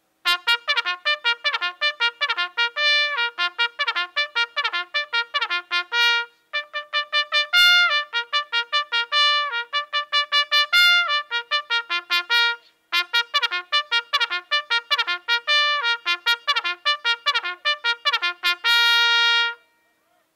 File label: Morning aka Reveille on bugle; c1880 Cycling Bugle; henry keat & sons cycling bugle